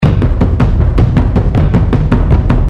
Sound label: rhythm Spin Loop; gold Totems Wiggle; tiki-beats; eyecon